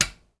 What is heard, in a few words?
Push button off